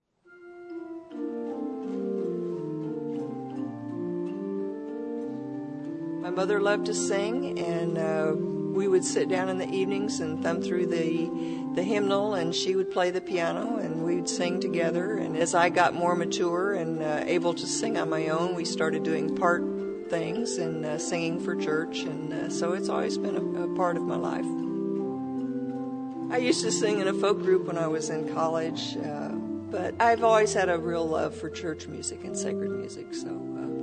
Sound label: ORGAN